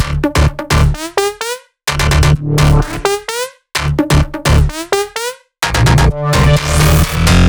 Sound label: Melody Kits 128BPM Root D; Vengeance Samples